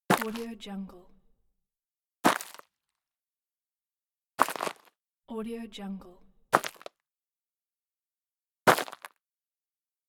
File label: walk gingerly with dress shoes on soil